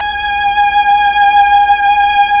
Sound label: STR, Melo. Violins, Slow Violins; Roland LCDP09 Keys of the 60s and 70s 1